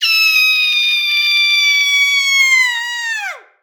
Horror Human Female Scream

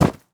Weather Snow Boots Jumping On Snow

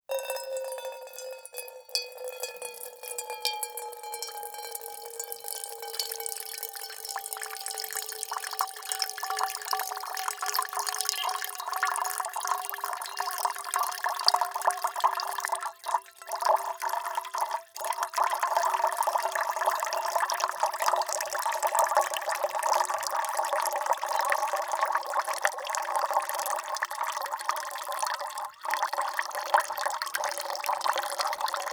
Liquid Water Pouring Into Small Metal Pot

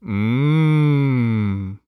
Human Male Mmm

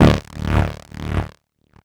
Transition Distortion Power Source; Production Element Title Transition

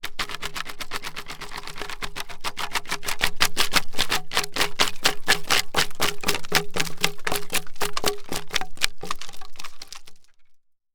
Impact Wood Shake Earthquake With Wood Debris; Impacts

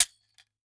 Foley Toy Gun Handgun Firing

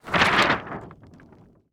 Foley Paper Shake Short

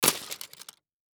Impact Plastic Debris Thick Hit By Plastic Tube; Impacts

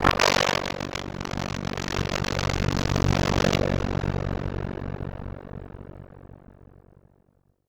Transition Blast Distortion; Production Element Title Transition

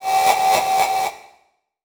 Transition Tremolo; Production Element

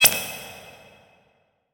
Click Mechanical; Multimedia Internet CD-Rom Flash

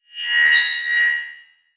Transition Feedback Ring

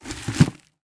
Industry Dirt Scraped Into Bucket

